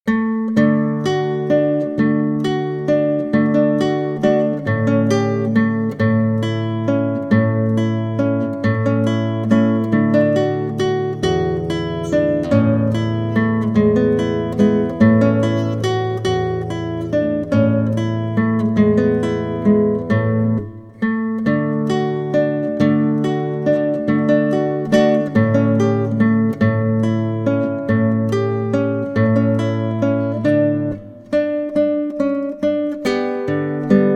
moderato, guitar

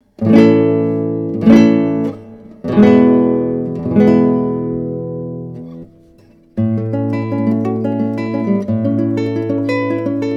prelude, guitar

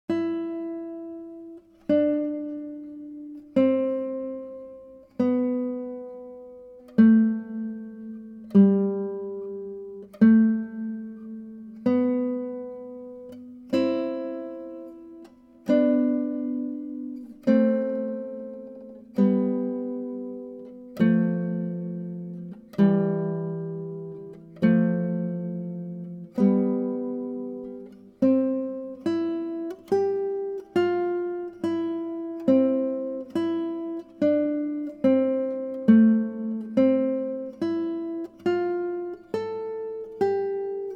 cannon

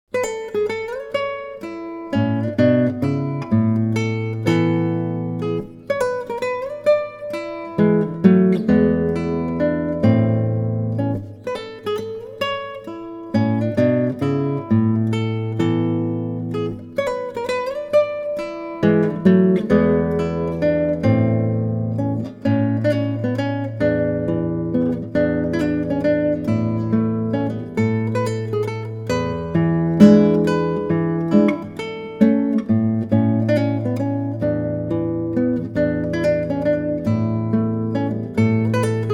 allegretto, guitar

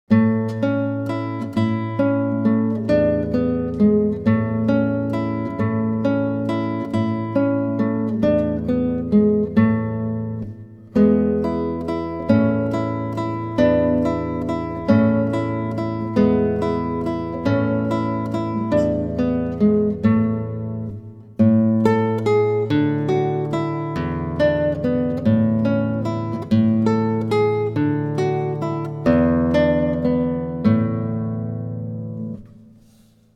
moderato, guitar

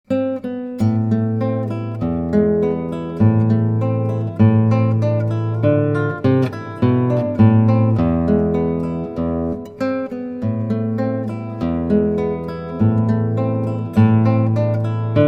andantino, guitar

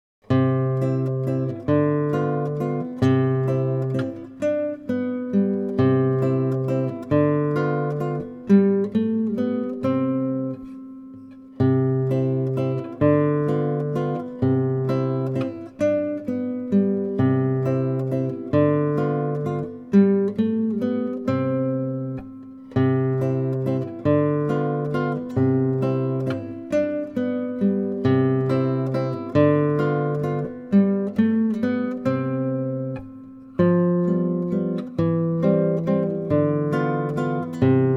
waltz, guitar

